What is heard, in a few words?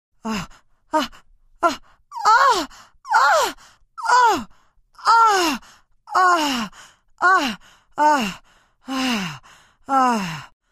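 ston jen, women moan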